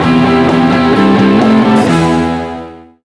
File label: Win; hot wheels battle force 5 2009 nds gamerip